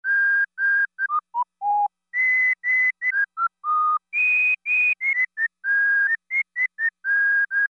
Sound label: Whistle, Roland Juno-60; Factory presets